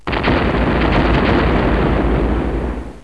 Program error; Imperial

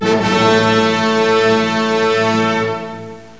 ORCHEST

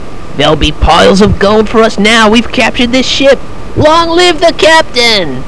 Boarding Victory

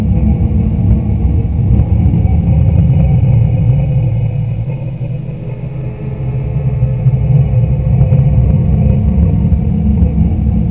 Sound Vocal Eerie Moan; Creative Review CD-ROM 23

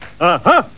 Ah Ha; NewHomer

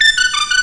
Flourish

